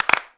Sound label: CLAPS, SINGLE CLAPS; Best Service; World Colours